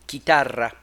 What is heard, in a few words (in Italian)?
chitarra